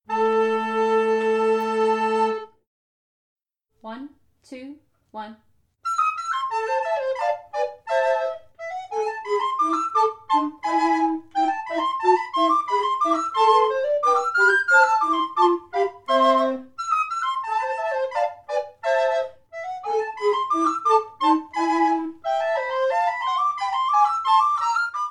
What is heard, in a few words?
gavotte FULL CONSORT, recorder; Chaminade